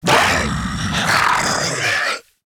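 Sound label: alert, zombie male; nmr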